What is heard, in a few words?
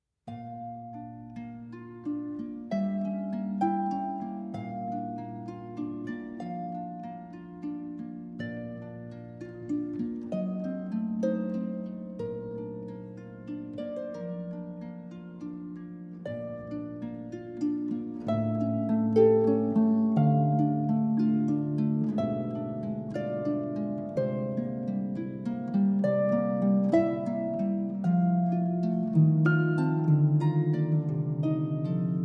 Nocturne in B Flat Major, Version For Harp